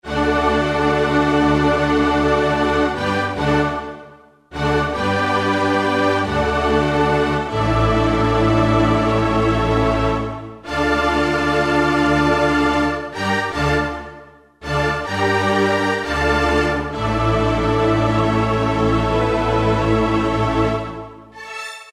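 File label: Choir + Strings, Roland U-110; Factory Patch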